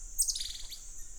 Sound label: Saguinus illigeri